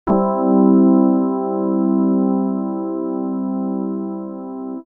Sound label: Digi Pads; Jungle Frenzy 1